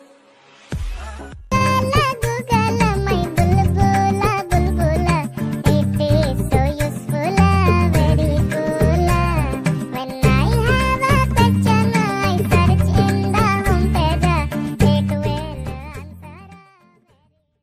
cute english music ringtone

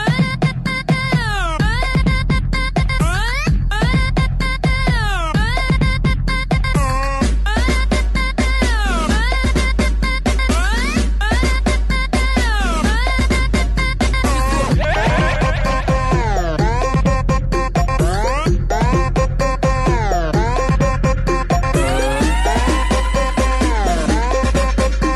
beat ringtone

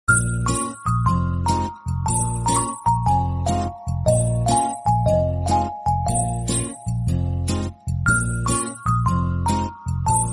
peaceful lovely call notification tone

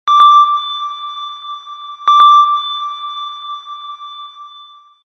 pulse ringtone, sms

